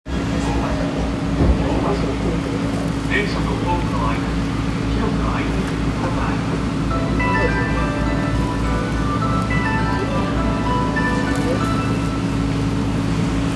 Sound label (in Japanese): 銀座線京橋東, train sounds